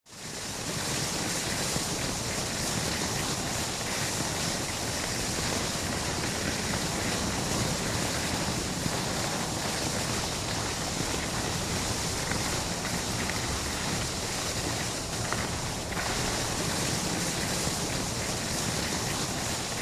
Ski ambience mid slope distant ski lift

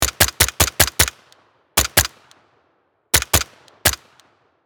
Slow firing machine gun short burst

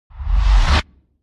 Cinematic transition build up

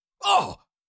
Cartoon lion voice sigh surprise

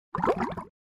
Fart in bathtub bubble surface

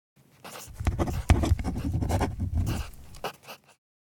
Writing on paper handwriting pen short

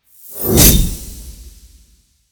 Ice spell designed impact; gfxsounds

